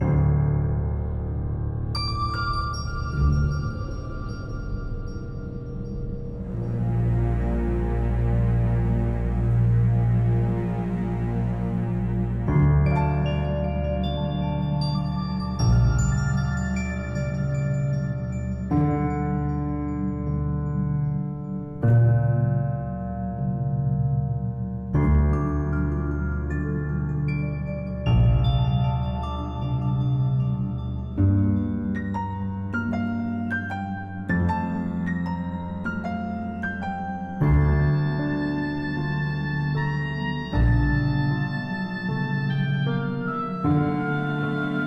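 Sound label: Forest Ambience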